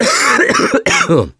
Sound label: cough, mechanic; voice